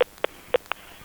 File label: Click loop